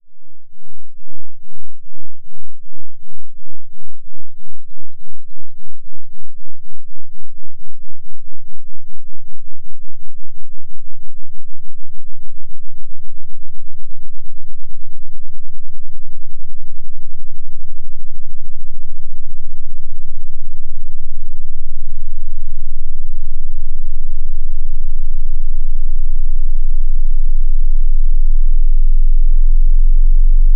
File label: Logarithmic Tone Sweep; subwoofer